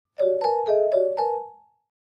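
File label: Clavichord Short Funny; keyboards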